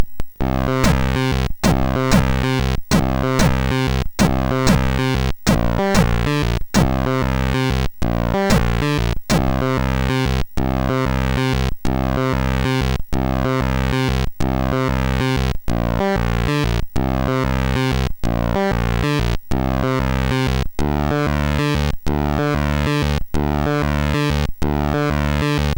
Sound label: Test Music; sid MOS6581R3